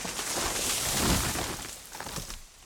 tree fall; Spookystation

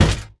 bang

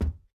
step shoes, footstep

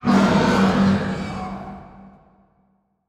roar far, alien voice

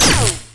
Taser, weapons

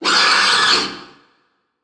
hurt, alien voice

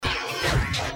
mech move

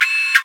ui toggle combat; Bubberstation

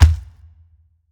step; alien

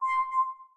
capture crystal problem; CHOMPStation2

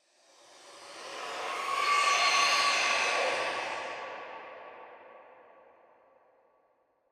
screech far, alien voice

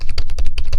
keyboard; VOREStation

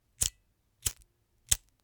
lighter on; VOREStation

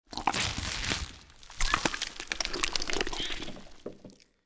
hatch, alien

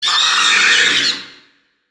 hurt, alien voice

